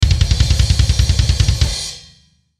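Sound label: Musical Element, Drum Snare March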